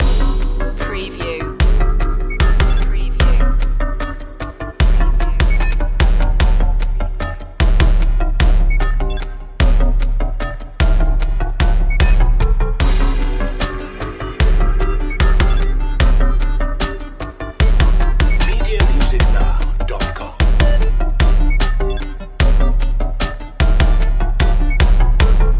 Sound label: digital clockwork loop